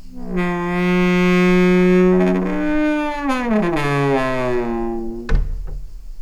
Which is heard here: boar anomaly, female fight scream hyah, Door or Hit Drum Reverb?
Door